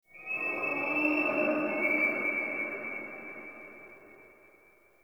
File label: Stag Stampede Vocal Grunts; Powers